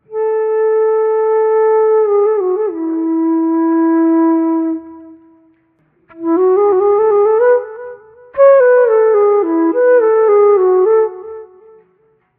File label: flute; grade3; nepali; ramro bani basalau